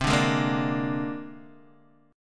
C 7th Suspended 2nd Sharp 5th, C, down, Guitar, DADGAD